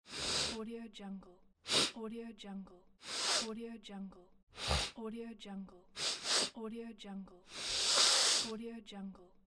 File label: sniff